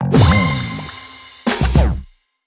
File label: X P Level Up; Subway Surfers City Sound Effects; Android